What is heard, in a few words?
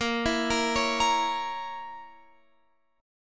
Bb Diminished Bb Guitar Open F; arpeggiated; JGuitar